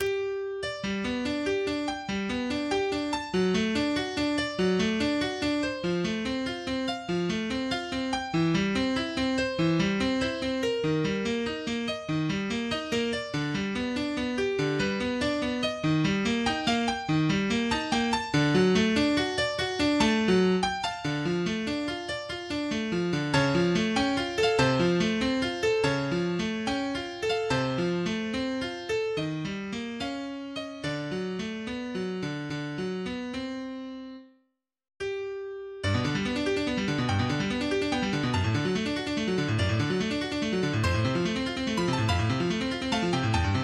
Prelude in G major

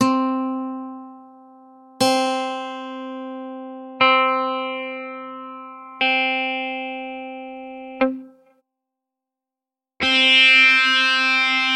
guitars

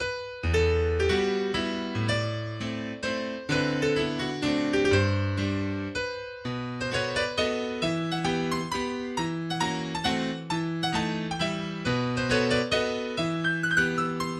Mazurka in E Minor Lento ma non troppo

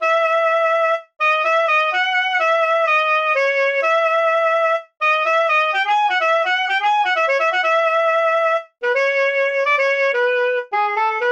Saxophone Solo

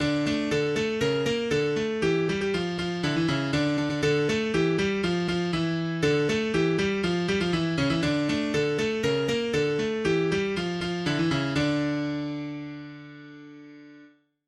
Twinkle Little Star Turned Evil

